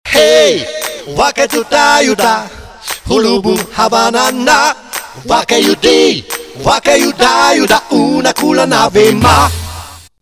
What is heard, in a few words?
Vocal; tropical band